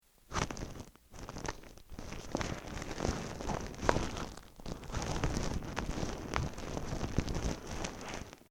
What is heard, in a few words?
ziploc bag